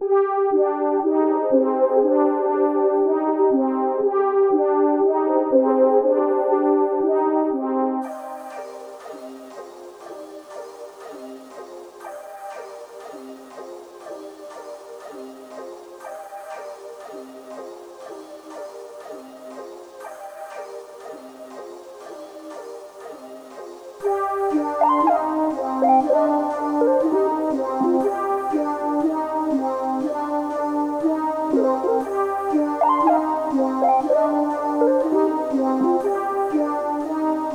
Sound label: 120 BPM G Min, Melody; Cymatics; 2022 Melody Collection Platinum Expansion